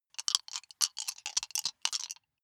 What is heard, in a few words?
big three rattle in hand closed